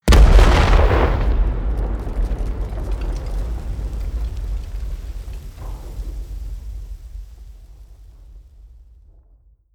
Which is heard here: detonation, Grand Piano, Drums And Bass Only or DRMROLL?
detonation